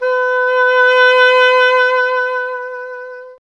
WND, Solo Instruments

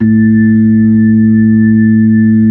B-3, Keys of the 60s and 70s

